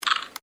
Camera Digital Canon IXY 60 Take Picture; Cameras (Sound Effects:Electronics)

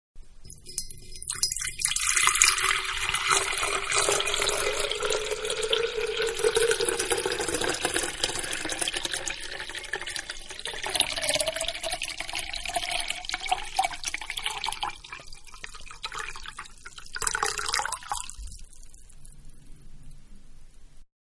Pour Into Large Pitcher, Water; Pouring; Manhattan Production Music

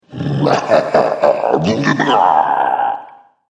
Alien Ogre; Alien Sound Effects

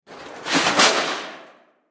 Crash Debris Junk Metal; Crash Debris Junk Metal Movement; Sound Effects:Materials; Blastwave

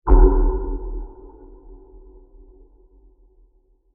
Extrem Low Impact; Low Impacts; Crafted Signals

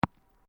Sport Ball Rubber Bounce Gravel, Rubber ball; Bounce Sound Effects; Objects

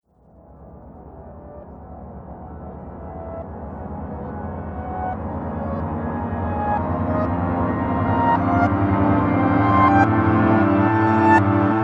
Piano Horror Short Drone

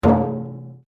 RUBBER ON METAL HOLLOW; Metal Sound Effects; Materials

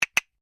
Castinets double, simple wooden persussion toys; Musical

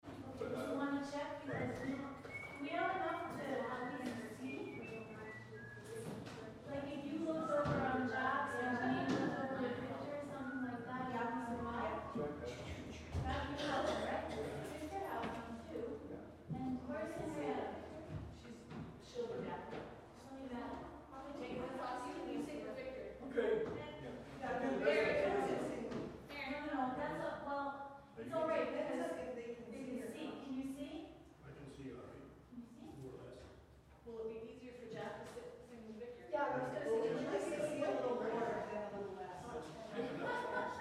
Crowd Choir Rehearsal Roomy Conversation; Crowd , Church Choir , Rehearsal , Roomy Conversation; Crowds

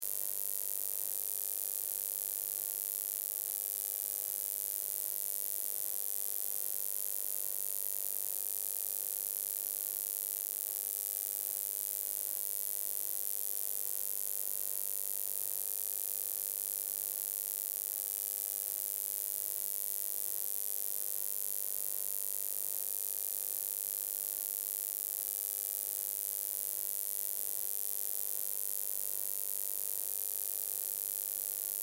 Sci Fi Buzz Hum Ballast Electrical Loop; Tech

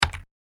Pc Keyboard Hard Single Key Press; Computer Sound Effects; Andrew Fleming

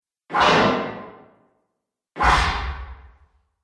Sword Whooshes Small Hall; Sword Sound Effects

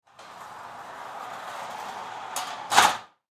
Industry Locker Gate Close; Gates; Doors

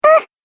Cartoon Game Voice "Uhh"; Cartoon Voice Sound Effects

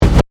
Scratch Hit Record, Dj; Production Element; DJ (Sound Effects:Musical); BlastWave

